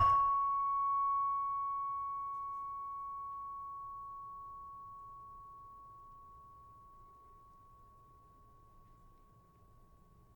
Saron 6 C Sharp, gamelan tuned

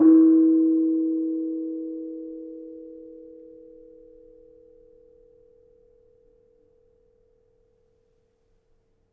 Kenong Pl 2, gamelan; gravityharps; timbrebox

